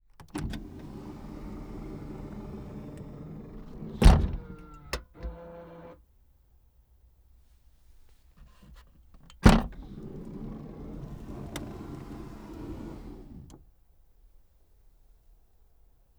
BMW 420D Gran Coupe foley exterior back door open close mechanism mono; SoundHolder